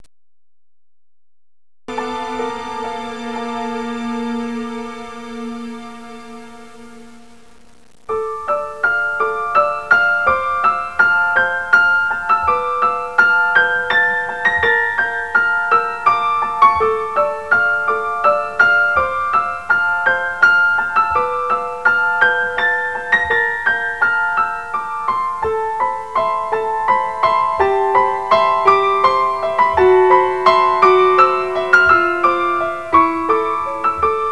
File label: NEW AGE